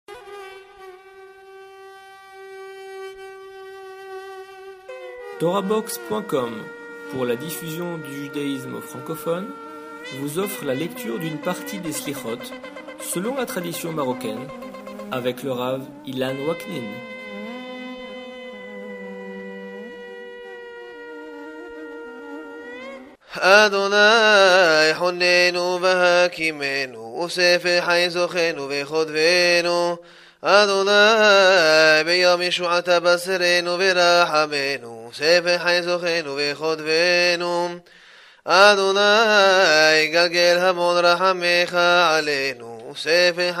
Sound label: Selihot marocain; Zokhrenou Vekhotvenou; Torah-Box